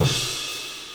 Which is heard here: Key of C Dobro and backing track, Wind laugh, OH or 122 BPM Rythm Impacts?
OH